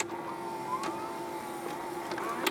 VCR Push Play Tape Starts; SFX Kit; Foley - Misc

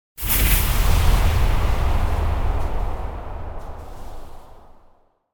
Hit Pouncing Scary Thin Beginning; Hollywood Edge; sound designer's toolkit v2

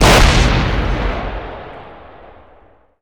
Explo Small Bomb; Destruction

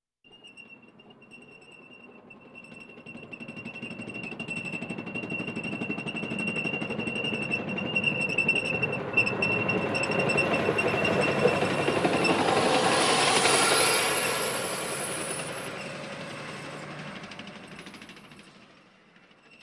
Military vehicles

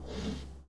Foosball Center Slide

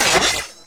Pickup Armour; SFX Kit; Pickups

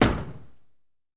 Mustang Door Close; Automobiles

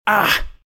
hurt; RadwinIsland

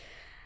female breath out; Apotheorasis

